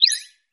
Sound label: join, chat